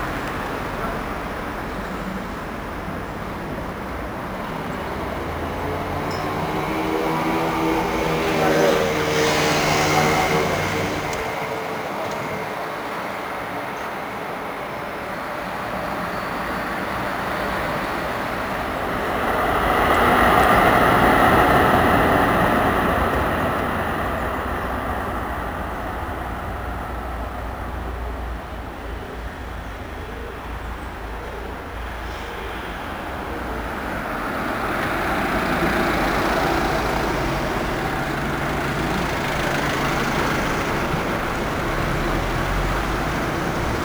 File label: ENV EXT Amsterdam traffic quiet